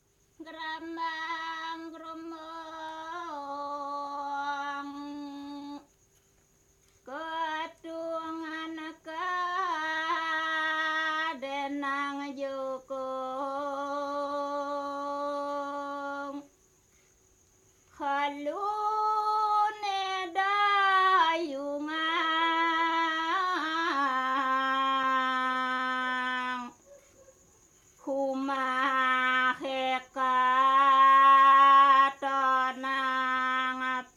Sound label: gending rare; Asak; Grambang Grombong; 1972